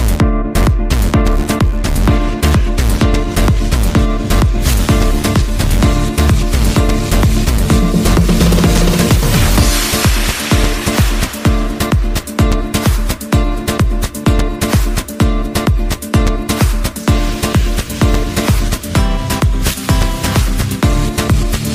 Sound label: best guitar remix